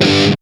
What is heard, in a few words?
METAL POWER; East West; Global Sounds